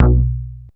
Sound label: Ind Bass (B), Synth Bass One Shots